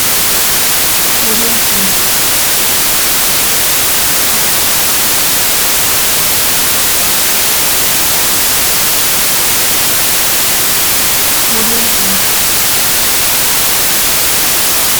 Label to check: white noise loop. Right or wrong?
Right